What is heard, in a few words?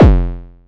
bass 808 ping F; Polyend; ARC Noise